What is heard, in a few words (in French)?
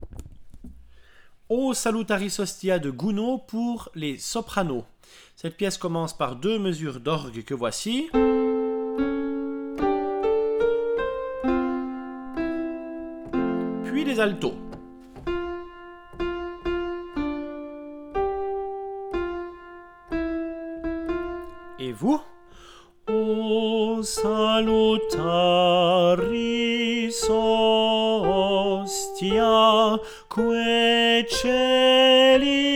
Chants O Salutaris Hostia S